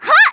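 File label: MON ATTACK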